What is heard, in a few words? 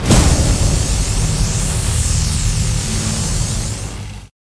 laser; weapons; starruler